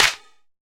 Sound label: hand clap f sharp key, Royality free clap sample tuned to the F# note. Loudest frequency: 3289Hz; percussion; single hits one shots